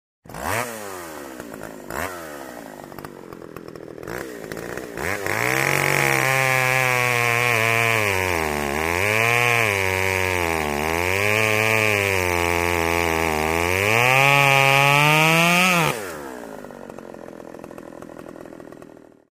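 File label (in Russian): бензопила распил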